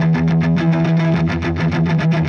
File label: Chunk Guitar; Sonic Foundry (Sony Creative Software); Indie Rock Anthems; Session 02 - Clinical